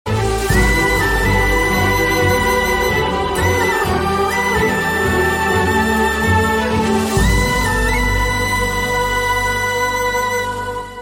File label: Love Bgm Ringtone